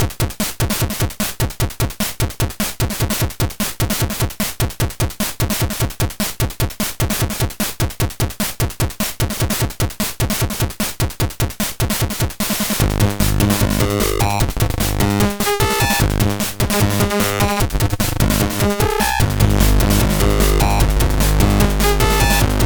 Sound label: Demoscene Type Beat